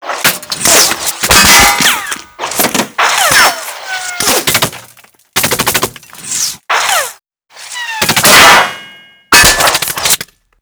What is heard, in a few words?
Hedge Impacts